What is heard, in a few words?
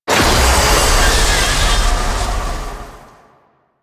Orb Path, Medusa Puzzle; Duviri